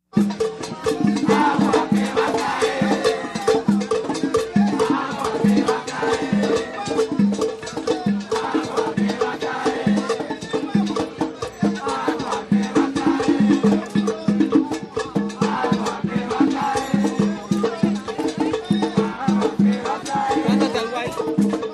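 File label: Central Park Festival Drums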